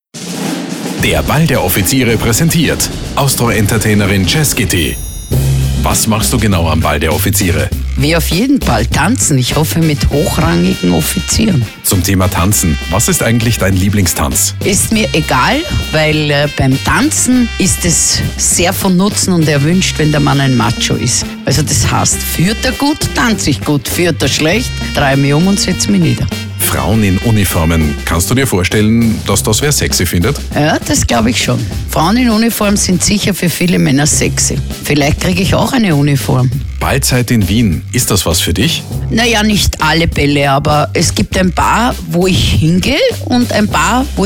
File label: jazz; balldoffiziere 2012